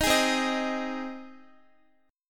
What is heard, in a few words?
C Minor Sharp 5th C down Guitar; Cm#5 strummed